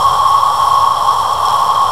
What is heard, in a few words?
VOX NOISE; AKAI S-Series CD-ROM Sound Library VOL-5